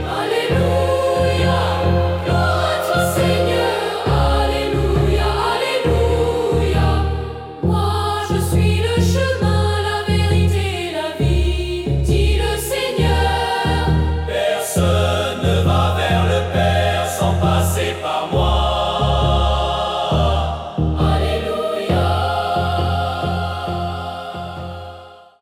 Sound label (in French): Acclamation Psaume